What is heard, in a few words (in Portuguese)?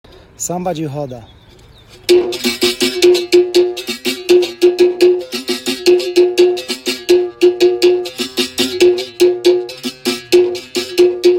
Samba de roda, capoeira